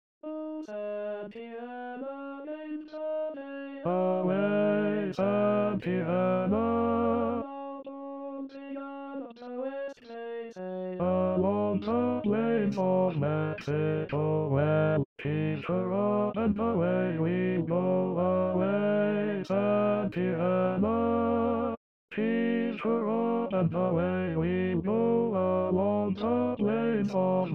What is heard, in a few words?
Baritone voice male in B